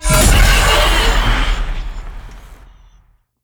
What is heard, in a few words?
fire boost